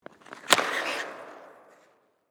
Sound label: Hockey Puck, Shot; Sports; Skate, Movement